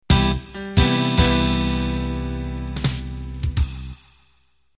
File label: Unplugged Slow Rock End